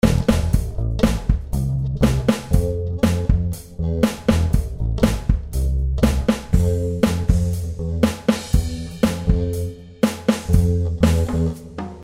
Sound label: bass